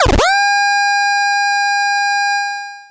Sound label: SH101 Tone; SH101 Tones; SYNTH KEYS